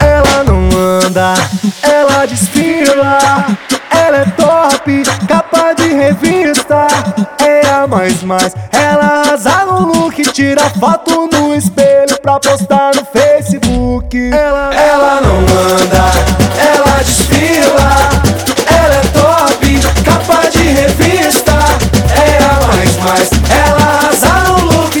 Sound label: Samba House